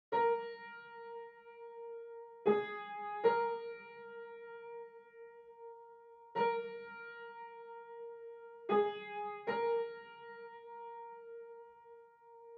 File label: 154 BPM B Min Piano; Melody Loops; Into Pieces; Cymatics; ANALOG EVOLUTION Beta; RnB Collection